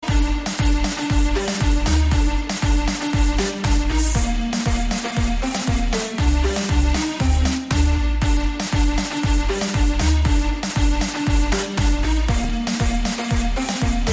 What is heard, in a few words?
firey background theme music tone ringtone